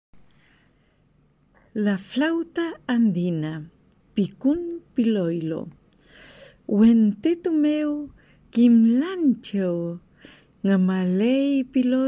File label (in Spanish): La Flauta Andina